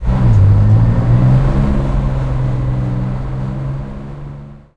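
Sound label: valentine engine interior load; cannons; War Thunder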